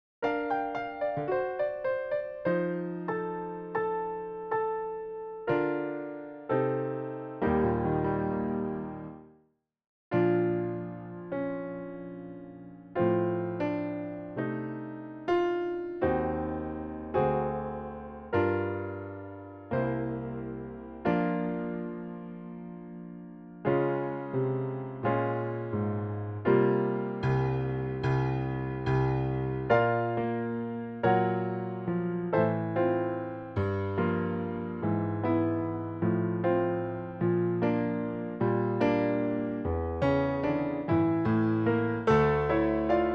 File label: backing track